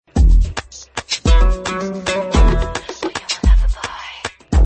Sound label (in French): sonnerie sms coquine